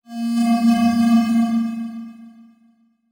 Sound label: Title Transition Stab Dark Short; Production Element